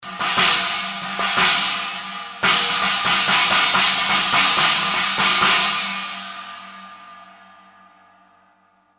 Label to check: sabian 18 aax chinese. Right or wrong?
Right